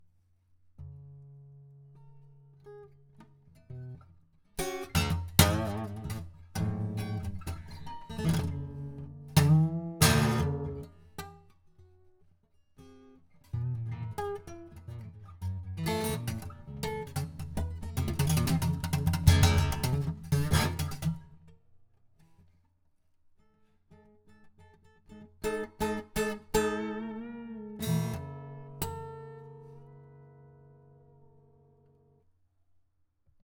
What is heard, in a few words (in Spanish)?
Grabacion de guitarra